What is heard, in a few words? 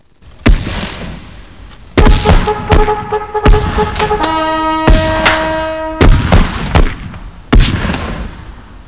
battle